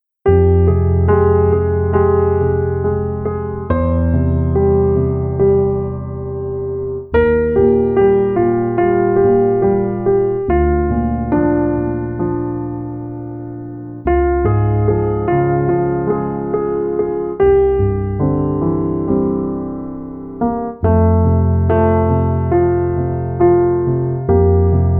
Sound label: Distant Thunder, piano